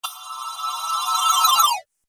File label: Arcade Game Magic Gate; Video Game Sound Effects; Retro Inspired Sound Effects - Think Nintendo, Atari, C64, Etc